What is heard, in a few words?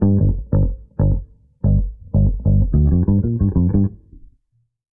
bass hey come on, Loops, Phonky